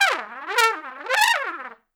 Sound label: Trumpet Noise; Traditional; Sonic Foundry (Sony Creative Software); Jazz Solos and Sections